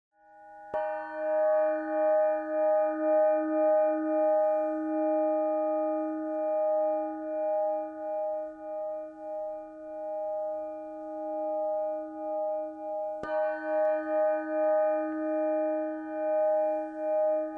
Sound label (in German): klangpyramide